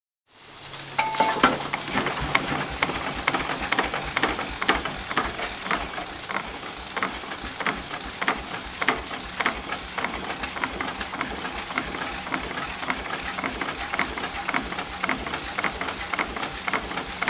Old farming machine dragged over field

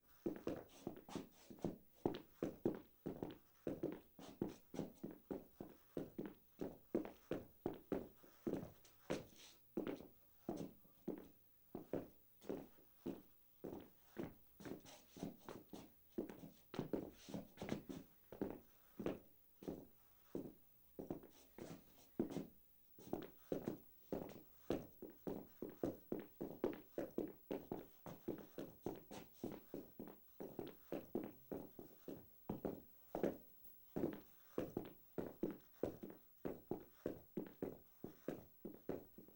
Footsteps Hard Surface

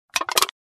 Empty can roll

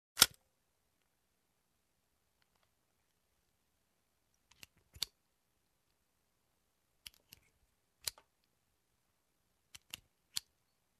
Reloading Gun quietly

